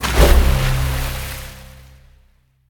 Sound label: colossal Land; strollingstaxx